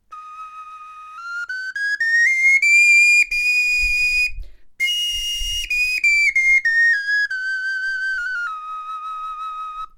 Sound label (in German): tonleiter, knochenfloeten